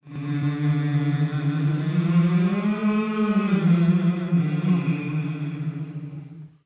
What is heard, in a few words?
VOX BG WET